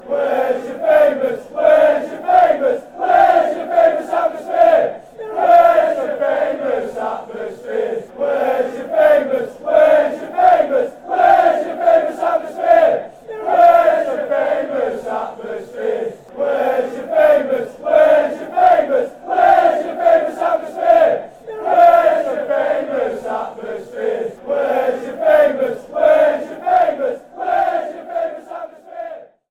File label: wheres your famous atmosphere, fan chants; stoke